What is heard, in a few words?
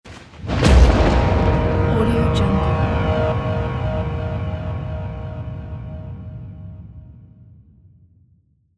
artificial thunder